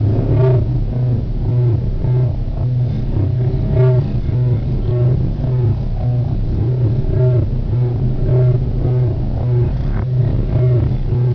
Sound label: noise, incinerator; hl2; citadel